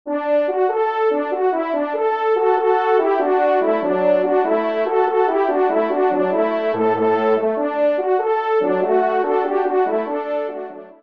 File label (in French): Vif et lumineux